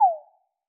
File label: Fall Small